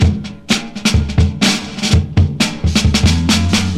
127 bpm drum beat e key, Free breakbeat sample - kick tuned to the E note. Loudest frequency: 1546Hz